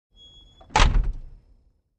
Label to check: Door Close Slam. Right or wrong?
Right